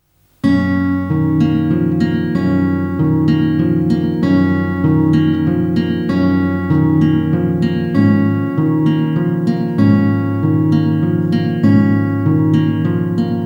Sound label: Instrumental